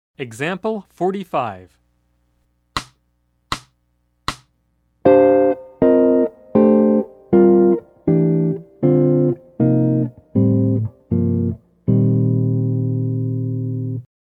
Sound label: Jazz; jue shi ji ta